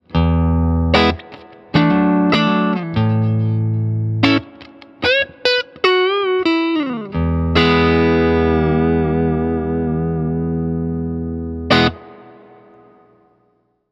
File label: Reverend neck clean